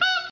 monkey; super trench attack 2

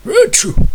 cough; super trench attack

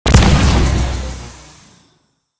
Atomic Shot

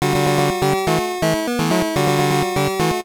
Puzzle Clear; pokemon picross unreleased gb gamerip 1999

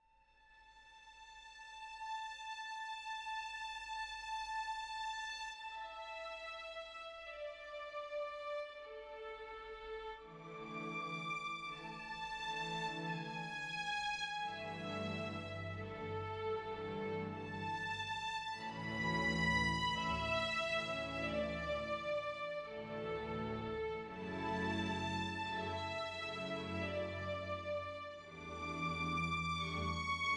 World Music

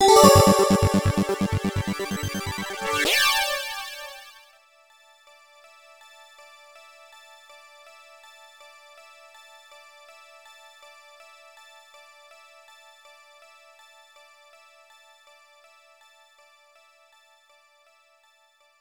Jingle Mission Complete!

